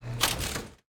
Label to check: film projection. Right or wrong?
Right